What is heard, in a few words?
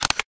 cam snap; LG; G2-Mini; camera